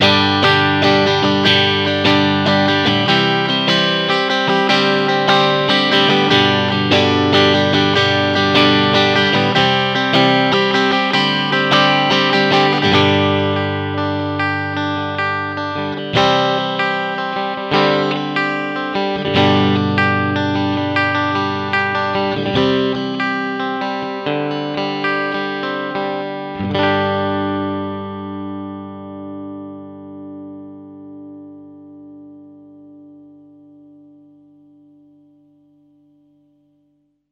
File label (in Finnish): clean strato talla ja keski; v-twin